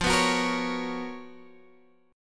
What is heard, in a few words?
Db 13th F, guitar